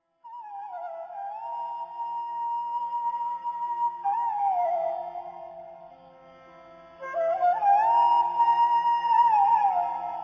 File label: Midday Melody Raga Shuddha Saranga